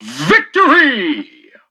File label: victory